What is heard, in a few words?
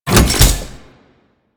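Research Facil Crane Move Horizontal End; Venus; Objective Rooms; Props One Offs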